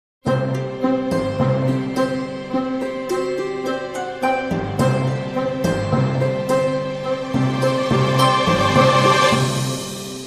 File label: Dramedy Sting